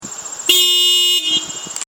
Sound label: horn before